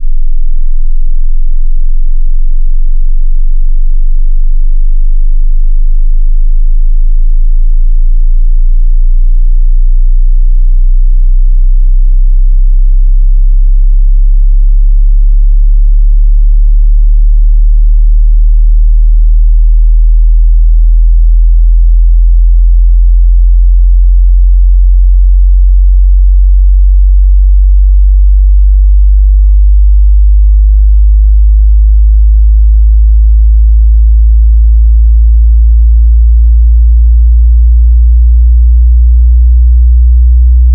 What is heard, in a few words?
Sweep 20 80; Eine Minute von 20-80 Hz; Hilft bei der Beantwortung der Frage, wie weit die eigene Abhöre runtergeht